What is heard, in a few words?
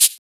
Shaker; FLPRO